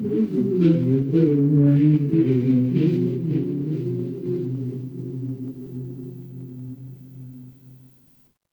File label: crowd applause